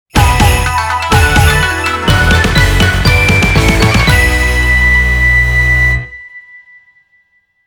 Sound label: Start Jingle; Zakuzaku Shichifukujin; Akane No Start Jingle